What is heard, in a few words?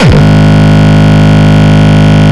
Longkick, kicks